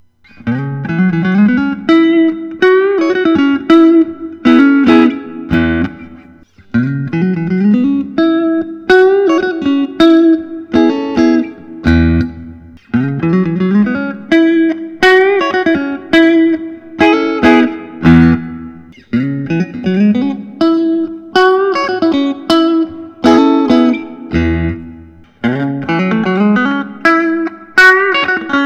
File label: suhr classic s surf green